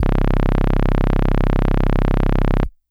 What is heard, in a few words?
Hard Brass DX100 C1